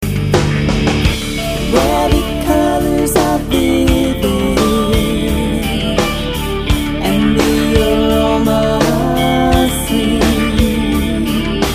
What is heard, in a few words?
lullaby